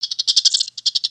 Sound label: Pets Skunk Happy; creatures pets; Lego